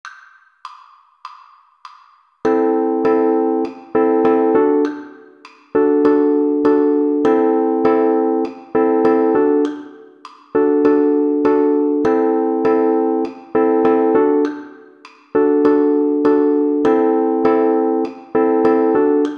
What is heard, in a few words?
Strum, uke